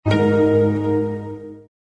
harp soft descending pluck

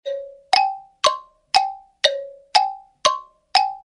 clockworks

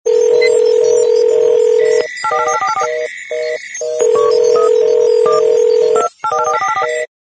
jumbled telephone signal

